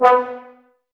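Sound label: brass trombones B, Woodwind Brass Ensemble; 90 s Sample CDs; Miroslav Vitous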